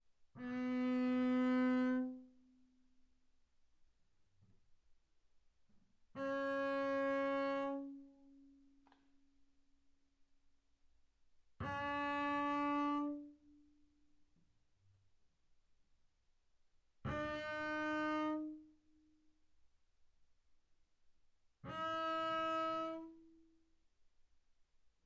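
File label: Bass arco sul G fortissimo C4 G4, double bass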